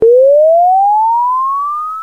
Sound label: 8bit